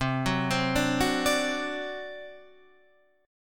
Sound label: C Suspended 2nd Flat 5th C down Guitar Standard; Csus2b5 arpeggiated